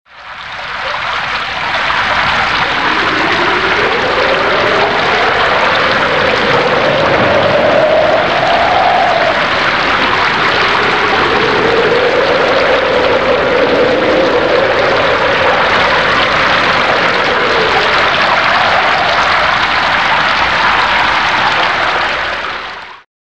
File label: Rain With Wind; Halloween Sound Effects